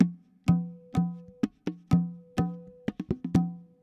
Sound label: PERC LOOP ETHNO 125; eJay